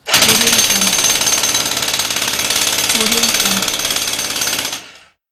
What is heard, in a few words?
jackhammer